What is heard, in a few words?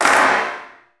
Clap, Gated Reverb; musicradar